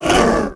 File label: hit; trando; Star Wars Obi Wan; XBOX